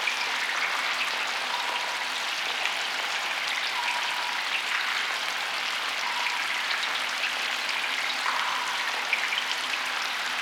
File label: Water Trickle Amb Loop; moul-assets